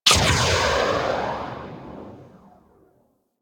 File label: Arch Rocket Crossbow 2D Tail, Weapons; Warframe; Archwing